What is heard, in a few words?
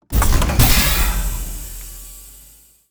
overheat